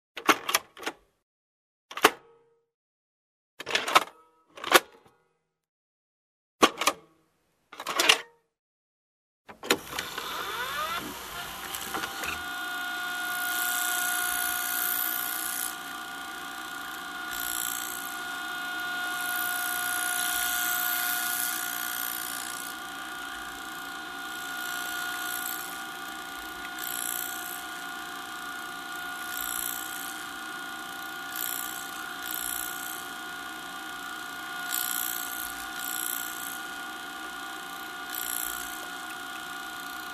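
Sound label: VHS cassette tape recorder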